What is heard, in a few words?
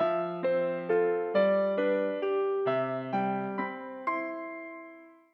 piano; nubia mile; ZTE; Grand-S-2